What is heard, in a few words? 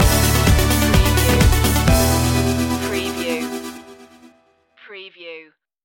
surf stinger